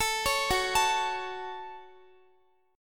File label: Gb Minor Guitar 4E,4A,5D,5G,5C,6F; arpeggiated